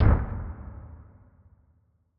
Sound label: Stomp; After Earth; Nova Sound